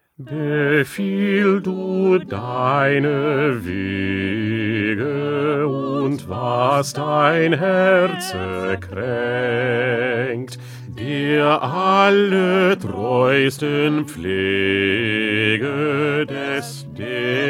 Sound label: Bass; Bach; St Matthew Passion